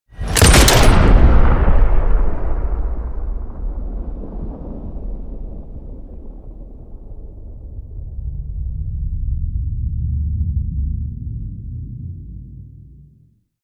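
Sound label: dramatichnyi vystrel, dramatic shot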